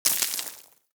Bullet Impact Debris Dirt; Warframe; Weapons

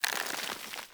Squid Plant Uproot; Objects; Traps

